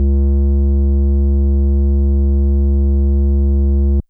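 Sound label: FM Sq Dist DX100 C#1; square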